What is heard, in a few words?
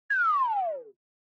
Motion Falling Whistle Low